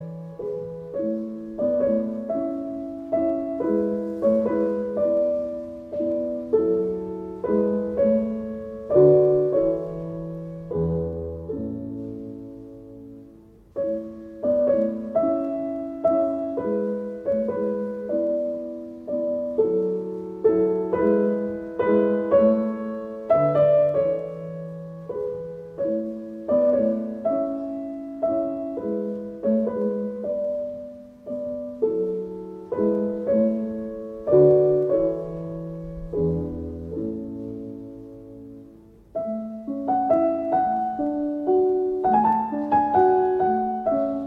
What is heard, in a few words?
Piano Sonata, Turkish Rondo, in A; Wolfgang Amadeus Mozart; Piano Sonata #11; K.331